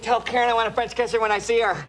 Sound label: French Kiss